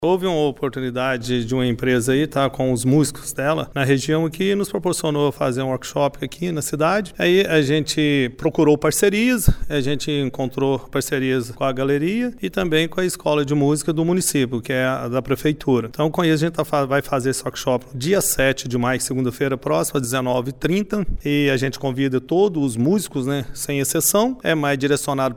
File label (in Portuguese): bateria